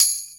TAMBOURIN; Pro-Rec - Synthsonic

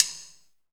SNR, Snares, Roland

